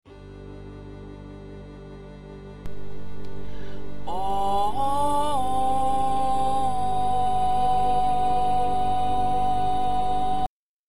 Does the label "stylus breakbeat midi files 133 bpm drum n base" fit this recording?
No